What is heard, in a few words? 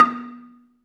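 Balafon 2 B2, Asian Traditions; Soundscan vol.60; AKAI; BELAFON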